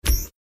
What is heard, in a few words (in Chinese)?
throw 卡片转代币